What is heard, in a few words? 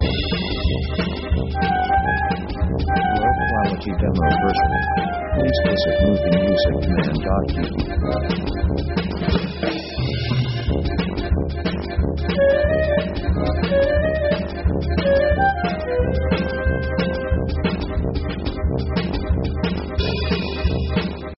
ethnic romani; funny themes